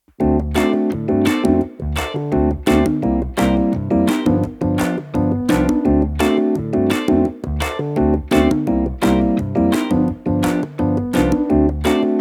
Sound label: cheerful entertainment light soft; by burak sound bank; cheerful vol 5